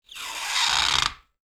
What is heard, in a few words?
balloon squeeze deflating